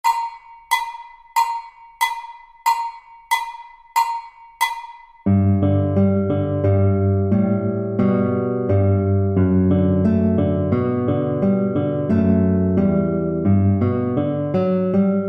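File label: Meadow stroll accompaniment, guitar; B string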